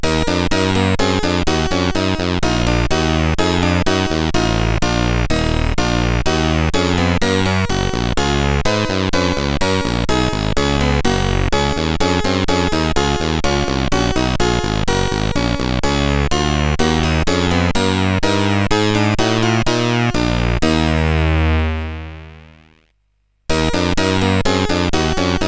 Bach CSG8580R5

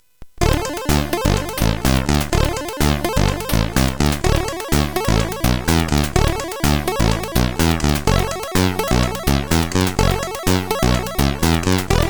Jazzy Tune, CSG8580R5

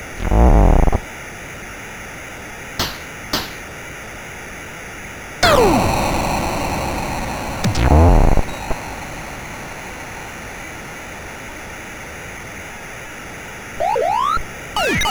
Big Funk plus FX, CSG8580R5

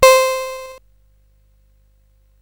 Paddle Mania, CSG8580R5

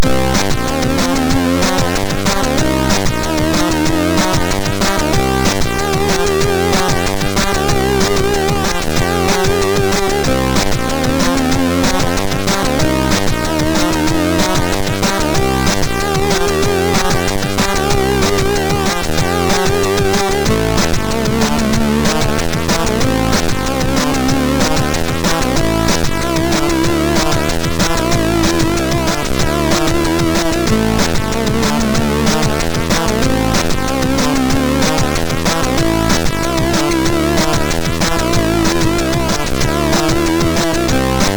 Star Music, MOS6581R2

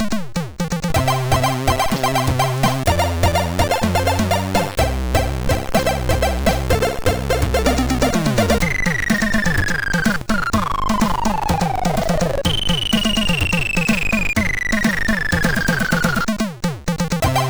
Intro samba, MOS6581R2

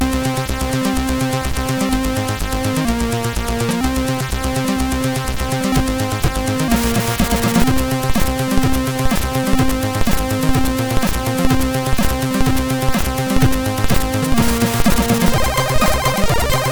Beat Machine, MOS6581R3; sid

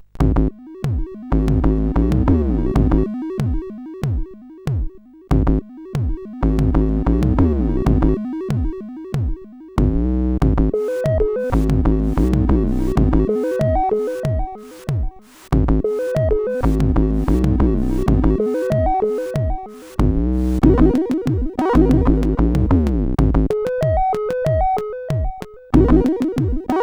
sid, CSG8580; hvsc

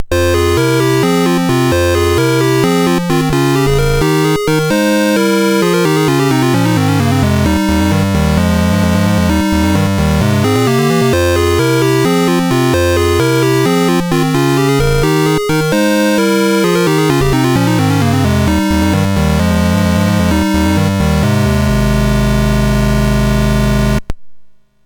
Dragonworld sid MOS6581R4

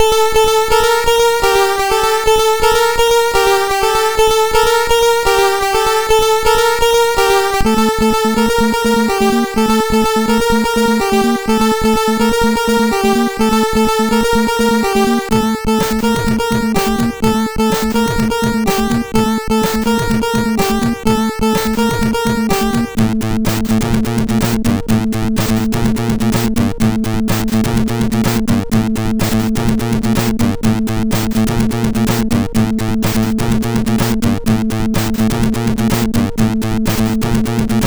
Techno sid MOS6581R4

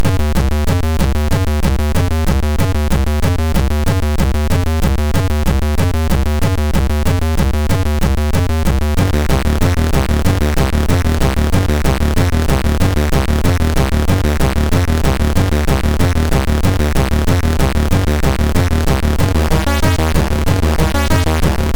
sid, MOS6581R3; Techno Stream; hvsc

